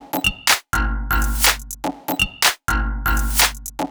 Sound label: Top A# 123bpm, House Drumloops; Zero-G House Fabrik Drumloops